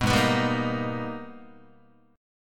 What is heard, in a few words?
Minor Major 9th, Guitar; G#mM9 strummed; JGuitar